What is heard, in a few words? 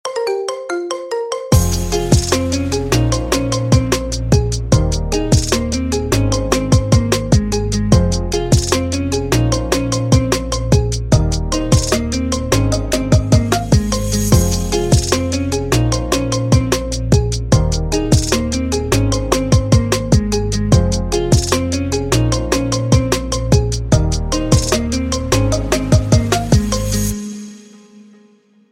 marimba remix